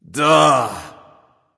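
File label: non-verbal ugh; smite; voicepack; houyi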